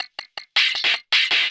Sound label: LOOP, 160 BPM, Drum & Bass